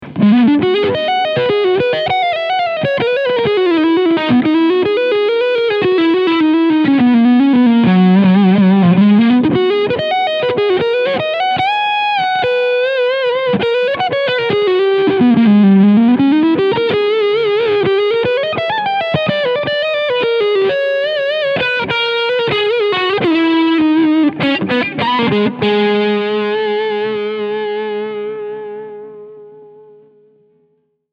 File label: Overdrive Neck PU; Ibanez FLATV1 BK Josh Smith